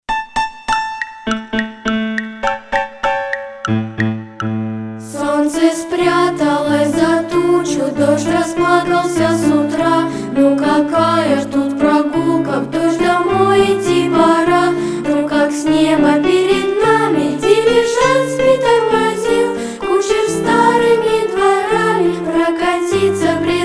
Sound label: Vokalynyy ansambly, vocal; GBOU SOSh 303 imeni Fridriha Shillera